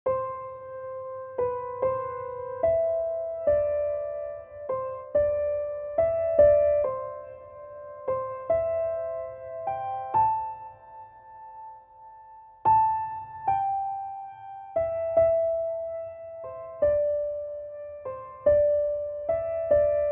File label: piano version; Navidad; Auld Lang Syne